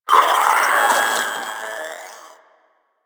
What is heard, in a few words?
Proto Infested Maggot Awake, Vocals; Warframe